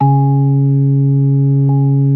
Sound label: B3 C#4; Best Service Hyper Dance